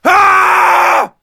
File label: Fight Sounds; Blood Knights; Xbox 360